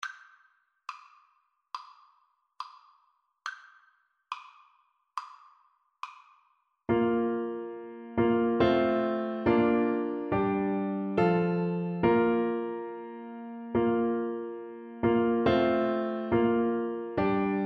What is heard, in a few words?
horn, French Horn